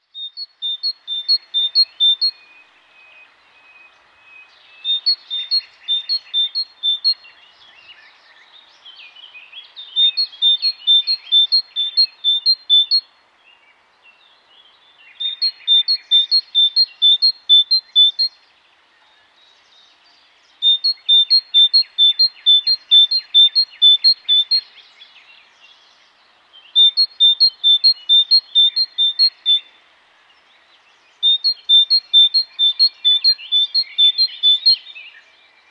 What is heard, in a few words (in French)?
mesange charbonniere